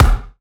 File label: Acoustic Drums - Kick; Heavy Acoustic Drums; Antidote Advent